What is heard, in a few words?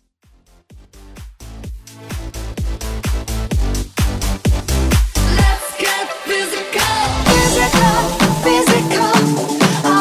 House Vocal